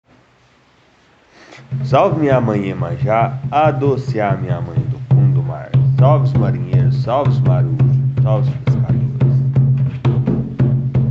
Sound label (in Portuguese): ponto cantado de marinheiro iemanja umbanda; terreiro ze malandro